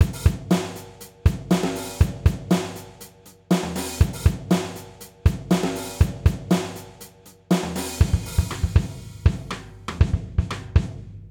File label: Band Drums Jazz Kit 120